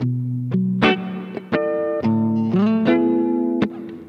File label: guitar, Phonky Loops